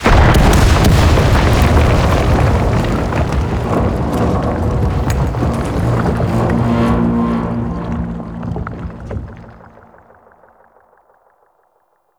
Exterior Explosion; Ambience; Gameplay; Warframe; Corpus Railjack; Comet Shredder

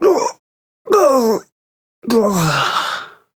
Death; Warframe; Corpus Lich; Persona C

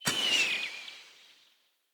Bow Arrow By; Weapons; Player; Bows; DendritBow

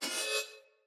Split Sword Accent Heavy; Weapons; Player; Swords; Starter

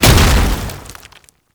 Tank Infested Strafe End; Warframe; Lasrian; LasrianTank; Movement

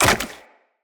Wall Jump Goo; Tenno; Footsteps; Wall Run

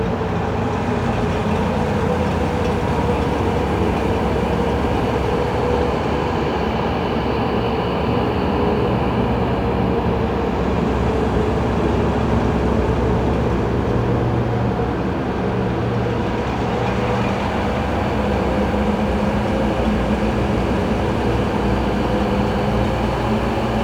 Shipyards Interior Ambience; Warframe; Grineer Shipyards

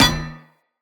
Unarmed Punch Impact Metal Shield; Weapon Impacts; Player; Combat

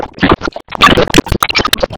Ambience Thunder Random; Cinematics; Warsongs; Warsong Prologue

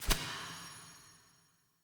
UI Default Map Marker Select

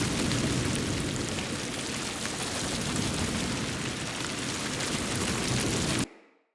Dendrit Staff Caster Block Loop; Weapons; Staves

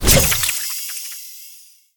Fishing Spear Throw; Weapons; Warframe; Eidolon Fishing Spears

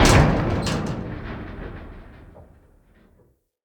Ship Hull Impact Small; Vehicles; RailJack; Railjack Hull Hit Effects

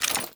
Generic Footstep; Warframe; Dagath

